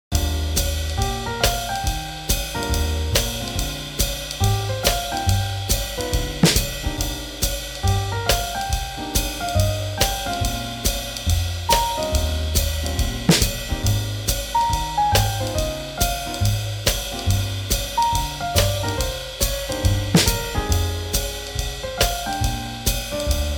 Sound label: Swing Jazz